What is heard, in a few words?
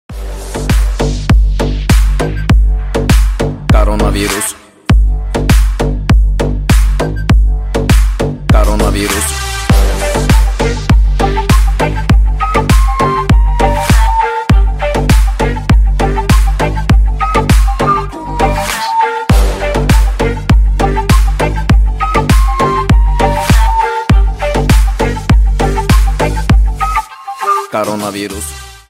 bass; коронавирус